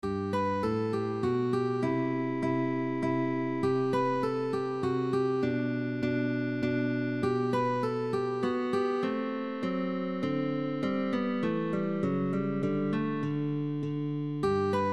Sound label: MENUET, minuet, guitar; MOZART